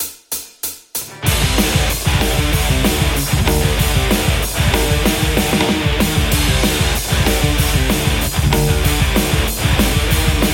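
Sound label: Telecaster; metal; kuassa vermilion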